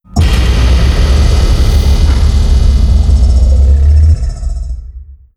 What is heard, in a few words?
event spy orb build complete; Stellaris; utopia